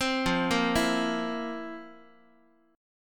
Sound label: F Major 7th Suspended 4th, Guitar; arpeggiated